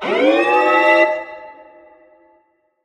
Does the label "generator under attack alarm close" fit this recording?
Yes